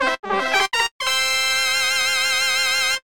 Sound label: BGM Fanfale; Irodzuki Tingle no Koi no Balloon Trip; NDS